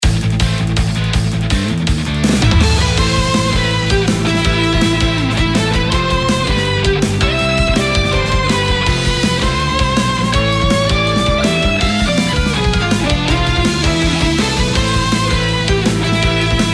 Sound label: Battle october limited